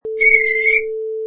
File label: Referee Whistle Short